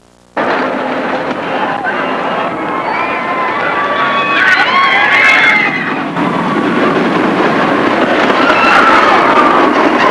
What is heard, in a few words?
ROLLER C